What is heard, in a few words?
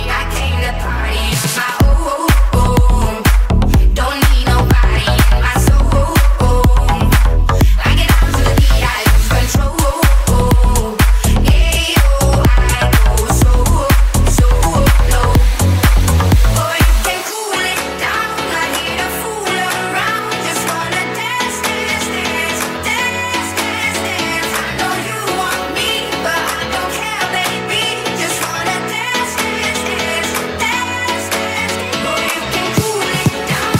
solo dance remix